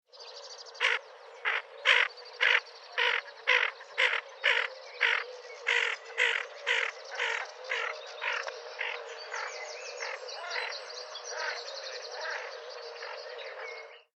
fuligule morillon, aythya fuligula

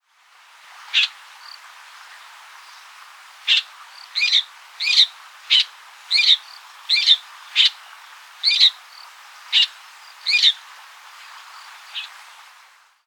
pie grieche a poitrine rose lanius minor

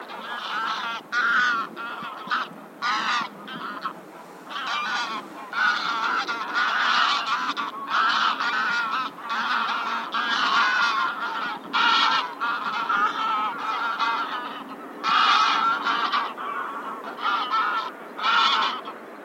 oie cendree anser anser

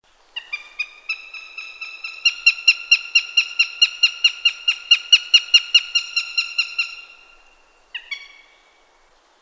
autour des palombes accipiter gentilis gentilis

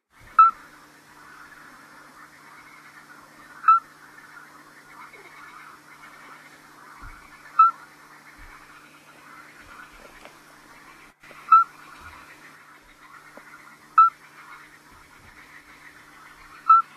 alyte accoucheur

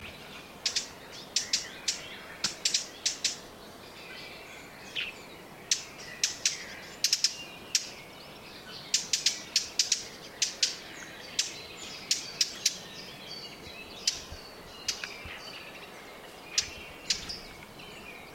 fauvette a tete noire, sylvia atricapilla

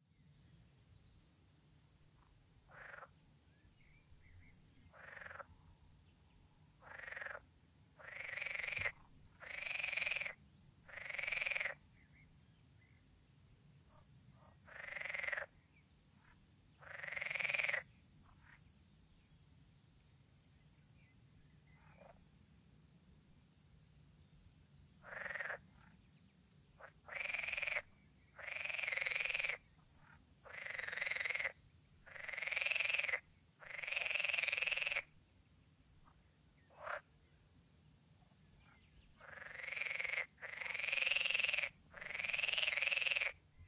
grenouille verte

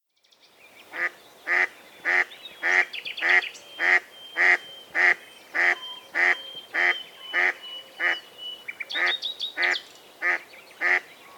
canard colvert

